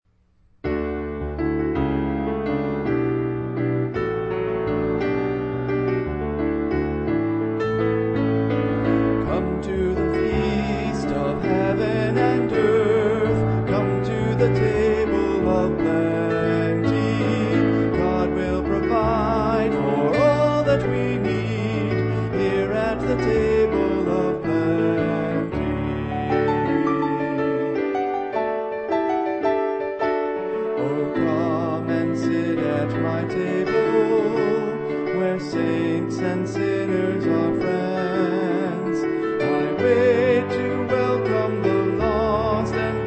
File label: Melody; St. Anthony of Padua - Choir Sunday